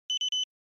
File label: Cash Register Beep